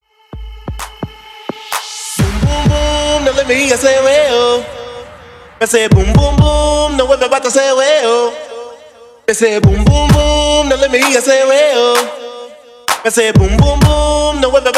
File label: Rework Hype Intro 129 BPM